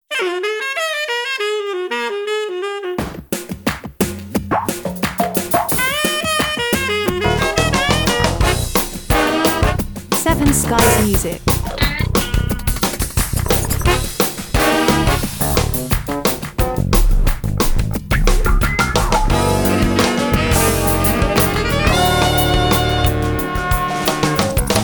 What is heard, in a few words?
Talk show funk